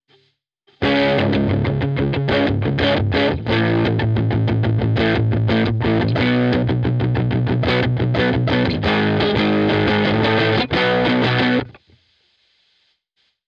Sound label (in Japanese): フロント 歪み